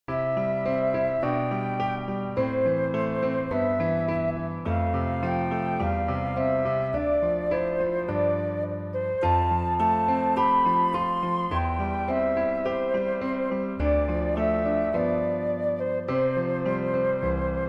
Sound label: Jesus Jesus instr; careme 2023